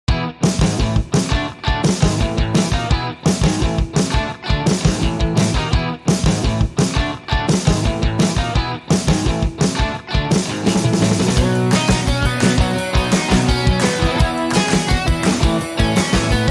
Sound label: No Bass